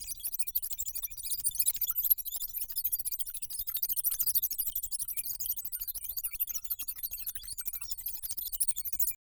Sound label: human